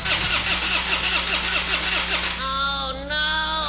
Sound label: no car start